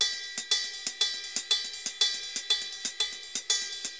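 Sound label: RIDE R, salsa drums and percussion; Best Service ProSamples vol.14; AKAI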